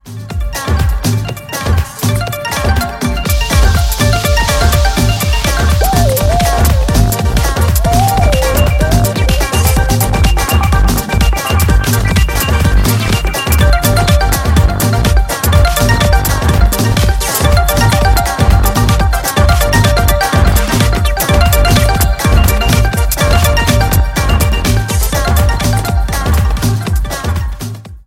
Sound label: Vivo Y54s, ringtone